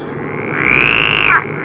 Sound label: epeirotica, waterfrogs